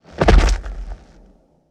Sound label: Body Fall On Dirt, Hand To Hand Combat; Sony Pictures Sound Effects Series